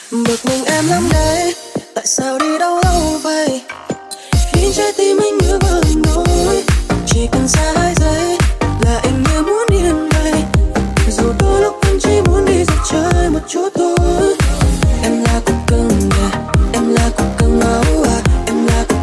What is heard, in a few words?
remix